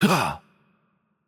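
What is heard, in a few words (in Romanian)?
Hurt Light, Romanian; VO; bloom minigame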